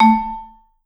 Vibes, A2; Sampleheads - Dave Samuels Marimba & Vibes

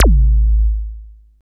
FX, attacks

